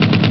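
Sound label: machine gun; ST-XX Amiga Soundtracker Sample Packs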